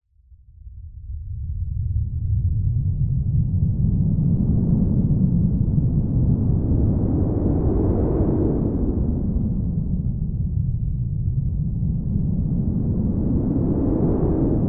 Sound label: science fiction cold howling wind blizzard